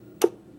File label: Click off